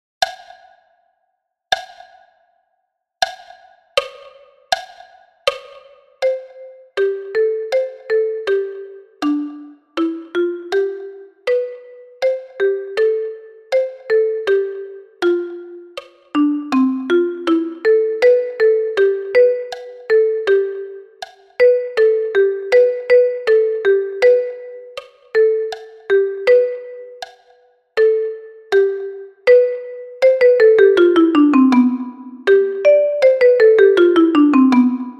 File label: 80 bpm, percu